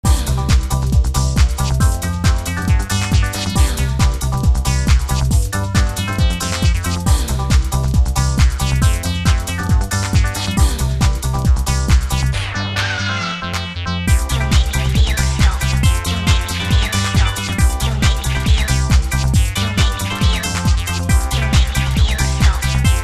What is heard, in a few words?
happy organ